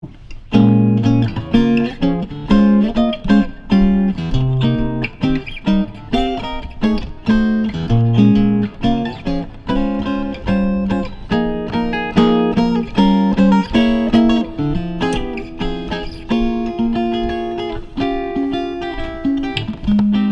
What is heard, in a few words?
Acoustic Chord Melody, guitars